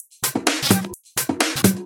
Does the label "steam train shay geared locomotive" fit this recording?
No